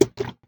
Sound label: Paint Splash